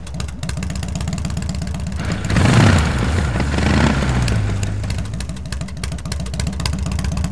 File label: Sidecar Idle; Seer; GamigoNA